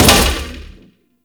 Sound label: Grenade Bounce, Weapons; Warframe; Corpus